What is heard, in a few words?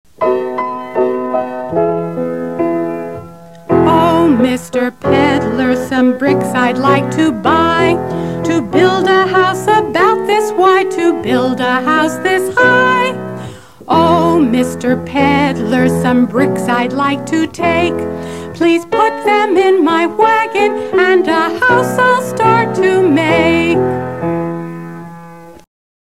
Pig To Brick Peddler Song Vocal; Pig-To-Peddler's Song (Bricks)